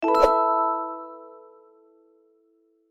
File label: Spin Wheel Emit; My Cute Commissar